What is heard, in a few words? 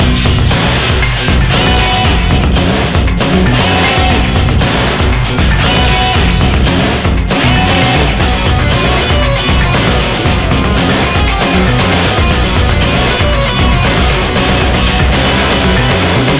loop